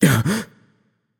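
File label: nonverbal breath; voicepack; smite; merlin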